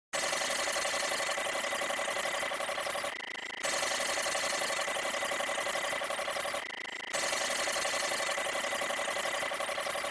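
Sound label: Computer Read Out Large Main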